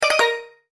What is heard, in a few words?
steel